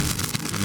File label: electricity